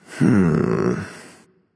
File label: thinking; smite; merlin